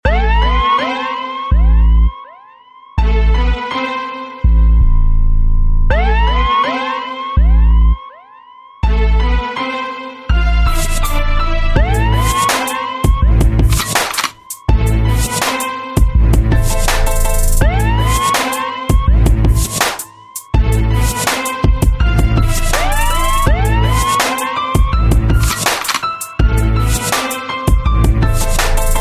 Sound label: Hip Hop Beat